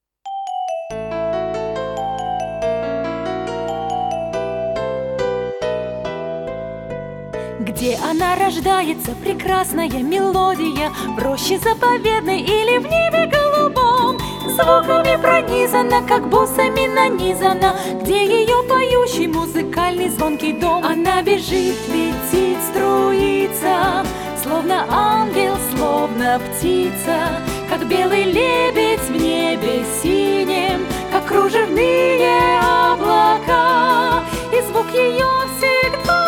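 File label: song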